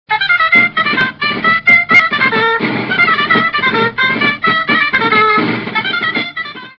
dulzaina; tradiberia

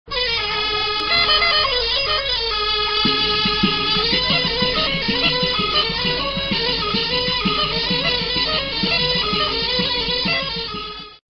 alboka; tradiberia

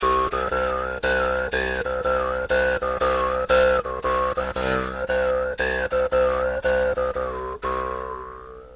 birimbao; tradiberia

